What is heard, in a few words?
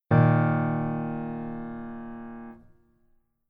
Keyboard Voicing Basic